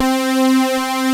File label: SAW, JV 1080; Pro-Rec - Synthsonic